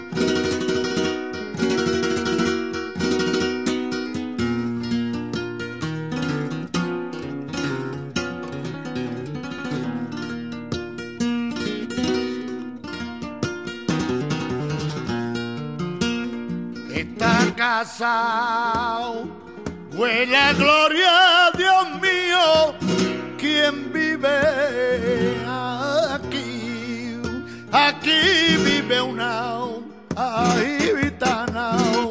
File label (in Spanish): Solea, flamenco; de Pinea